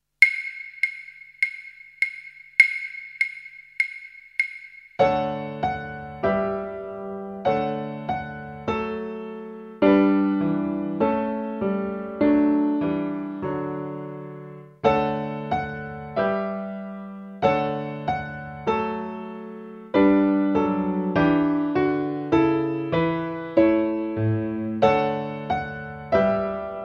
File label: Backing; Violín; Cockle Shells